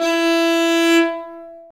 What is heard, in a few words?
nyckelharpa E 4; SoundBytes - Early Patches